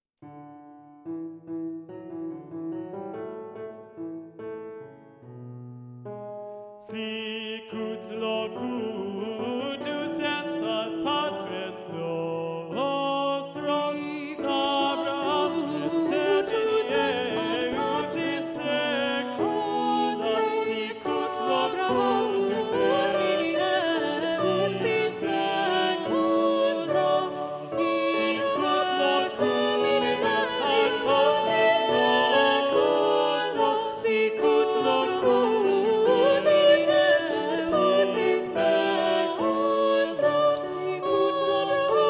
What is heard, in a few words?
SATB - Bass Muted; Sicut locutus est